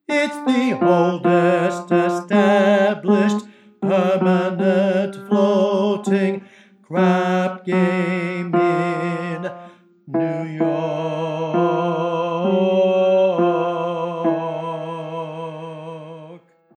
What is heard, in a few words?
bass